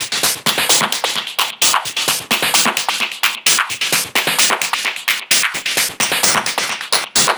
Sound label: Perc Loop 130, techno; Loops; Percussion; GhostHack; Ghosthack - Free Techno Sounds 2024